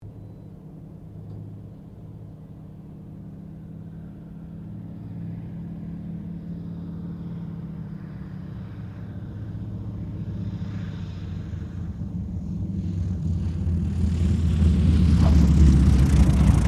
AIRCRAFT AT-6D HARVARD MK III LAND; Temora Harvard MK III; Airplanes; Vehicles; Airplane Sound Effects

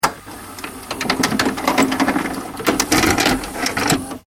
household vhs eject; Vhs Video Recorder Ejecting Cassette; Electronics; Vhs Recorder Eject Tape; McKinneySound

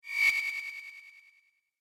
Shrill Sweep Sound Effect, whoosh; Andrew Fleming

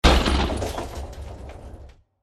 Massive Impact With Bursting Elements; Bursts (Sound Effects:Impacts)

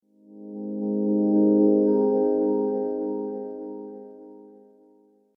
Slow Subtle Waiting, transition sound effects

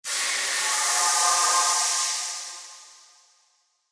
Magic Steam Sound Effect

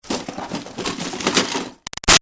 Foley Rummaging Junk Debris Busy, metal; Clangs; Materials

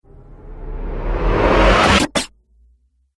Radio Stinger

